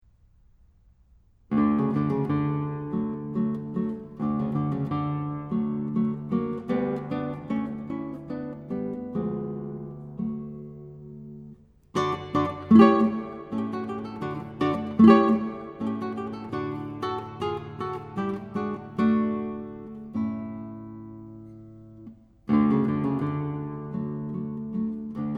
sor minuet, guitar

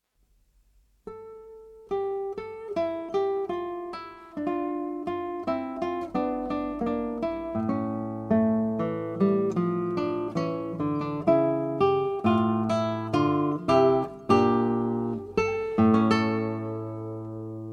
prelude

